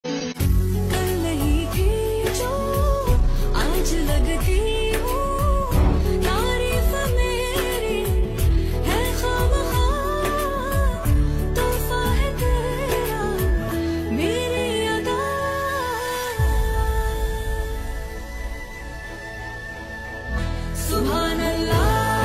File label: Ringtone